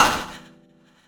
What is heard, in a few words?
Fx Vox; Equipped Music; Loungin House